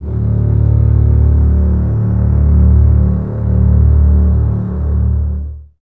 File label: BSS D 1, String Ensembles, Basses; Lo+Top; Miroslav Vitous; 90 s Sample CDs